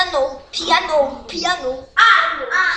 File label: piano